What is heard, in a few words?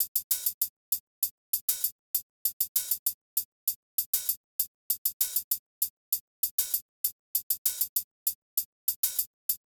Drums, Hip Hop Exotica, 098 Emin